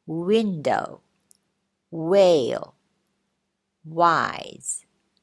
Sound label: window; 発音の難しい英語とは？世界中の英語初心者が間違える7つの発音〜基礎知識その４